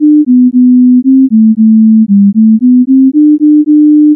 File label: pure Tones